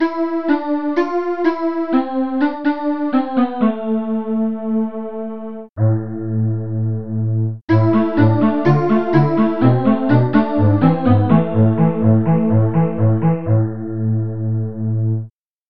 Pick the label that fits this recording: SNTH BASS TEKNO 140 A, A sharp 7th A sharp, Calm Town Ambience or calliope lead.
calliope lead